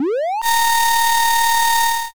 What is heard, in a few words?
drill